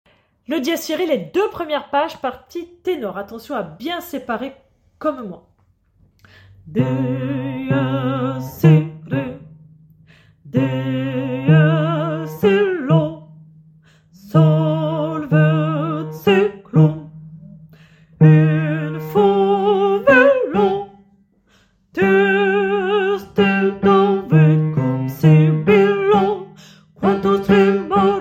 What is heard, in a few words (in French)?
Tenor